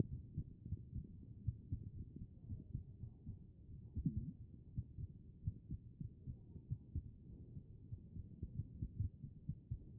HEART B MODE

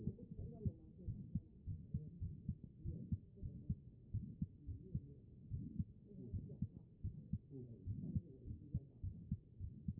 HEART pos4 B MODE

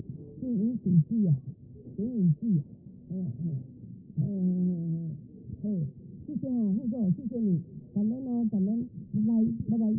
CHEST; B MODE

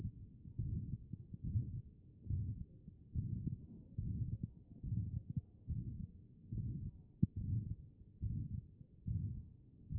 HEART; B MODE

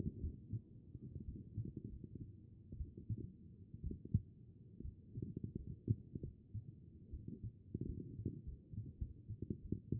HEART; B MODE

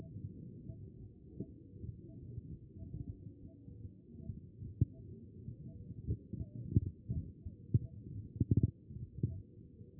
chest; B MODE

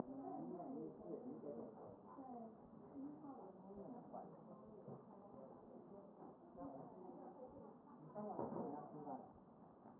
chest

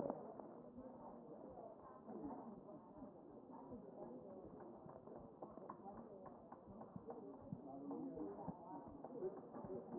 A CHEST

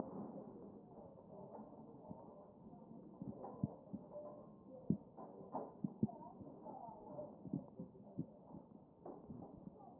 A CHEST

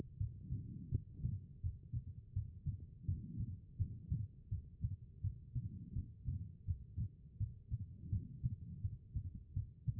HEART; B MODE

